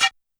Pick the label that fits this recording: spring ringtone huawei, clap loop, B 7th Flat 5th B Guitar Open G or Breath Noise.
Breath Noise